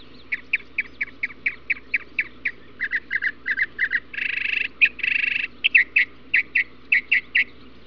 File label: mock song, mockingbirds